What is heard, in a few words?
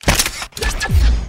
omnitrix ring release; lonekat takumi